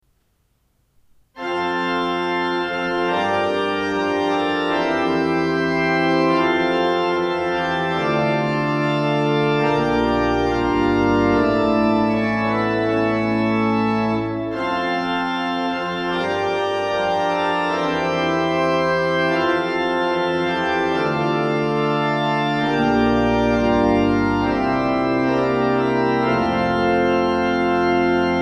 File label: Fanfare; Min egen fanfare. Låter bra på dette instrumentet.; Marcussen & Søn Spjelkavik kirke; ZOOM H4n